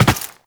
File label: Land Glass, Footsteps; Warframe; Tenno